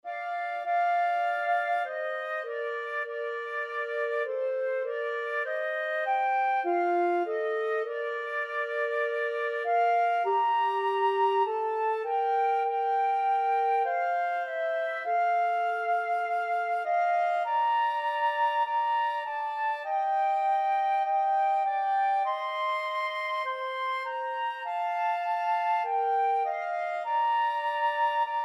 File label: it is well, flute clarinet duet